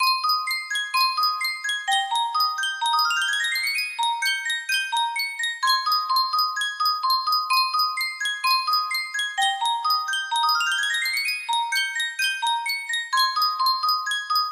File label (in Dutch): yunsheng muziekdoosje, music box melody; efteling carnaval festival